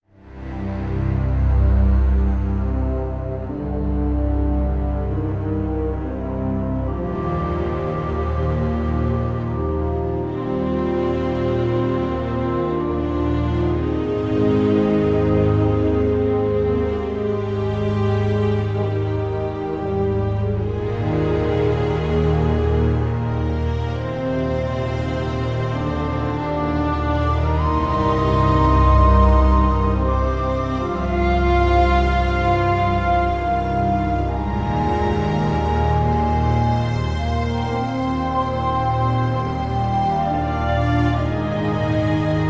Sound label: music northbeach